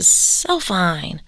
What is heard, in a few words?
"so fine", Female Vocals; PlatinumPhattCD2; E-MU Producer Series Vol. 8 – Platinum Phatt